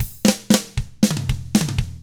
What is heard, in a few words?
116 ROCK, Double Platinum Drums; ILIO